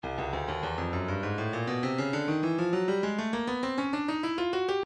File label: breakbeat midi 100 bpm; Stylus